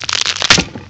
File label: die